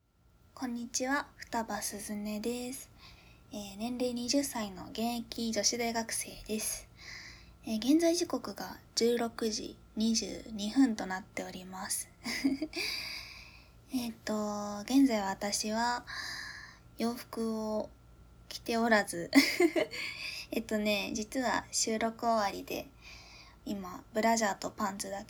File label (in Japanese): 手コキ音